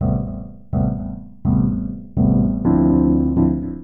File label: ORGAN VOCAL 125 A; Keys